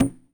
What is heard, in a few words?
Conga Lo